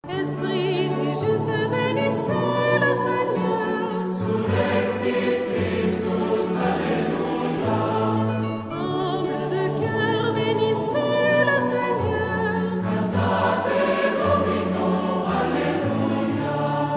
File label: surrexit christus solista